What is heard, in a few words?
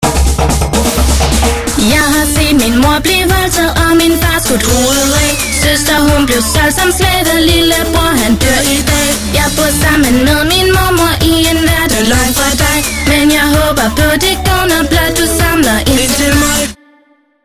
tv spot musik intro; Ringtones